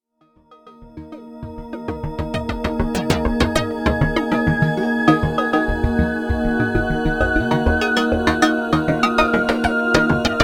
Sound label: synthex crazy mix